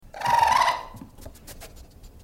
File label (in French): Raton Laveur